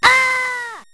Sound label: Pit Fall; AmoebArena